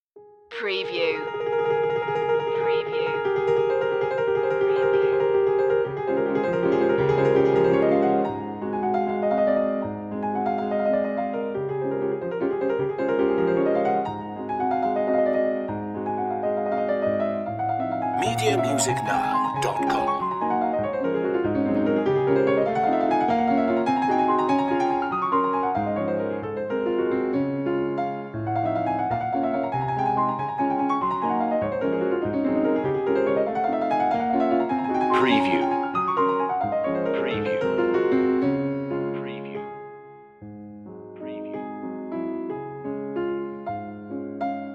minute waltz in d flat major; chopin

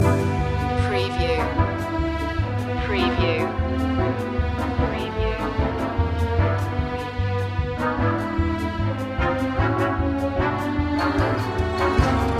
nostalgia tv loop

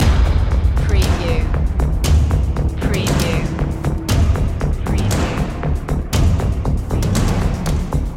the showdown trailer loop

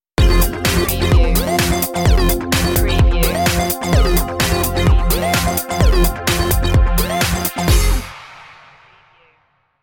friday night stinger

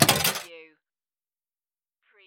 Pinball sound, chunky clank with follow-up clanks

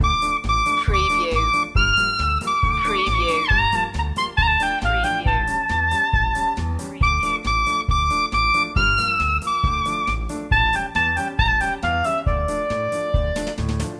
klezmer loop

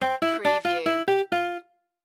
Casino Electronic Sound New Game

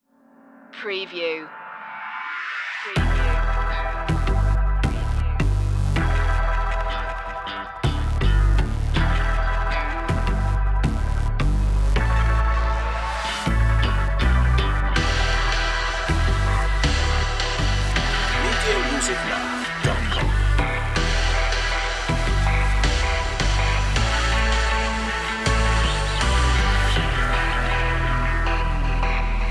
no drums

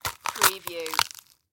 Green Pepper Crunch

